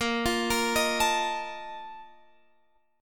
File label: A sharp Major 7th Suspended 4th, Guitar Drop D